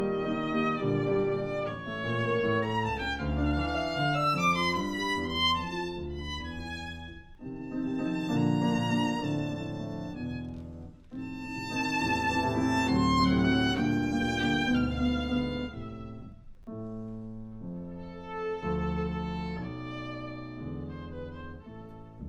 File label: violin wedding